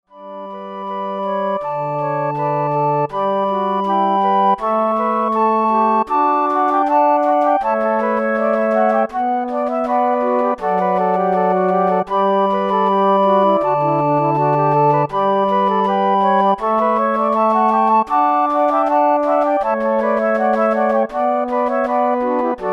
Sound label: Flute Ensemble